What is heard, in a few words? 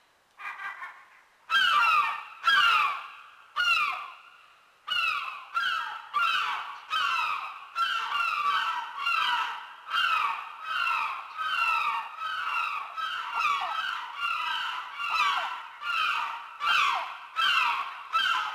Seagull; Project Gotham Racing 2